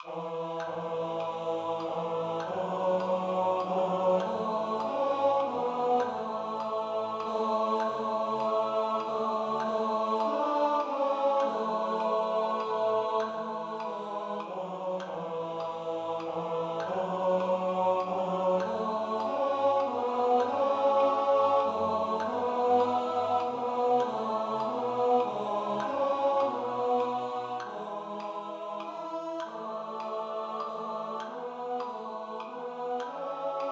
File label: Sommarpsalm tenor; Kantorei